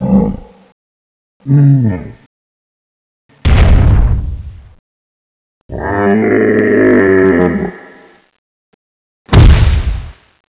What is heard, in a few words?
death; fallen titan